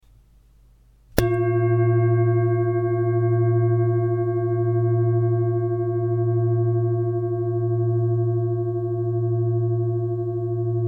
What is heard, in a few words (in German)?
Klangschale